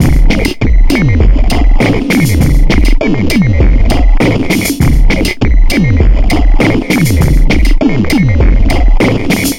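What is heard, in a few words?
Sickly Loop, beats; Sonic Foundry (Sony Creative Software); Esoterik Beatz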